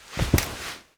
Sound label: pistol holster; Rust; eoka